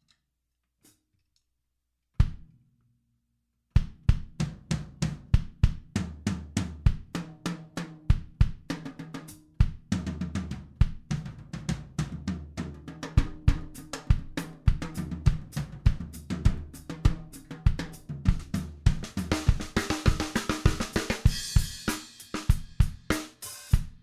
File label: drum sound; EQ mixed; Audient